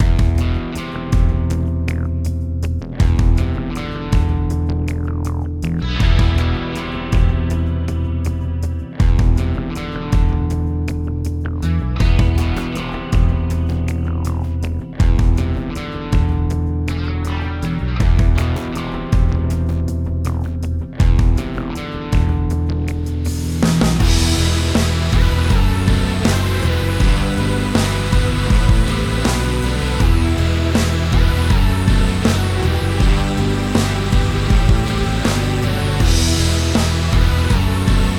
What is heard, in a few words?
gold cave; Magic GameClient